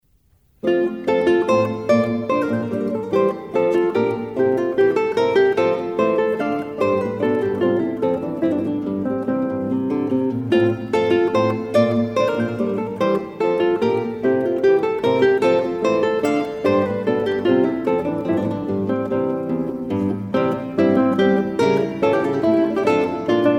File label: Menuet I II; Bach